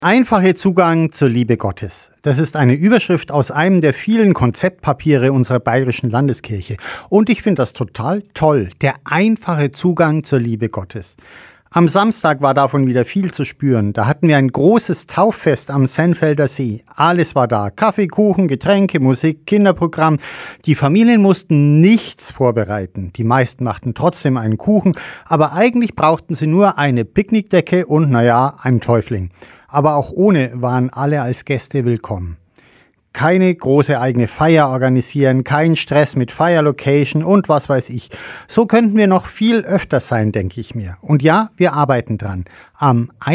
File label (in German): gong